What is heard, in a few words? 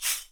TAMBORIM; E-MU Producer Series Vol. 5 – 3-D Audio Collection; 3D Percussives; 3D Ethnics